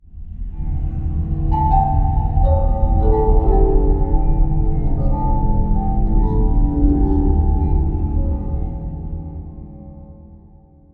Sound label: Amb bells